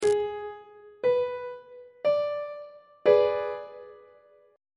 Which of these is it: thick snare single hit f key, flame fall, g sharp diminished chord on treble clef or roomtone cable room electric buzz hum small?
g sharp diminished chord on treble clef